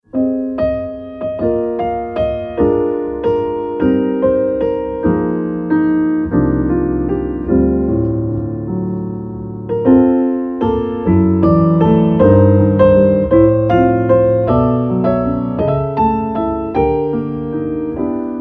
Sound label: piano solo